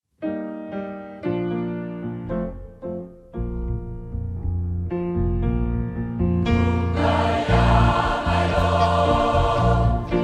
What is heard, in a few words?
Kum Ba Yah, Gospel Choir; Rueil Malmaison; FRANCE